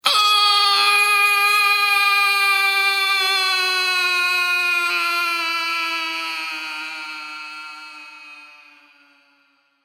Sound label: Falling Scream, Male screaming as he falls; Voice